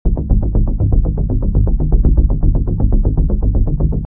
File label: deep arp